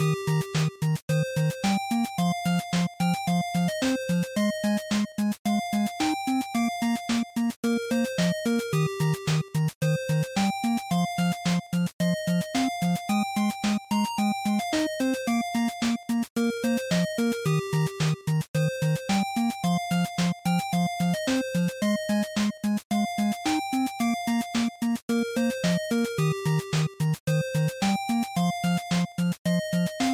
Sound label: NES JP Stage1 Loop110